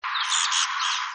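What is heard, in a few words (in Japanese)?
kogera, Japanese pygmy woodpecker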